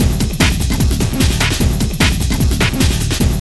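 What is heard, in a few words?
drum loop; X-Static Goldmine 3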